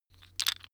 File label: tiles wood